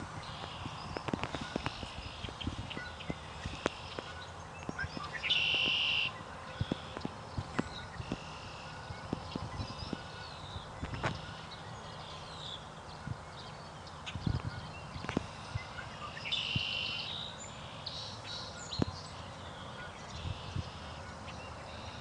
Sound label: morning sounds at 6th hole tee off